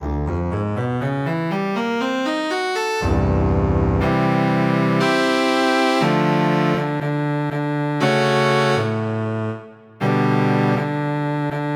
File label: Contrabass; EverQuest